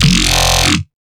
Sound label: Riddim Bass Hit F Growl; One Shots; Ghosthack; Riddim Essentials 2020